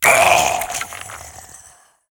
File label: Skeletal Smith Death